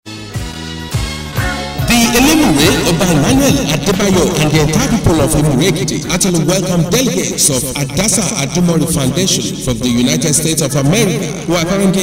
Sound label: Jingle